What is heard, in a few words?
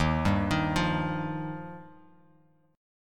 D sharp Major Add 9th D sharp, chord; arpeggiated; D#add9; 11,10,13,10; JGuitar